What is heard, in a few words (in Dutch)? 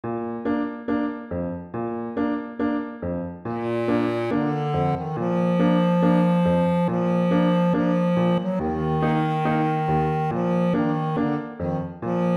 Karavaan VS voor altklarinet